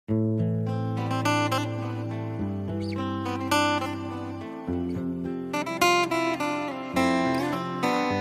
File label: Soothing guitar